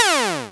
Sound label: fall, Retro Sounds; Kenney's Sound Pack; Retro Sounds 2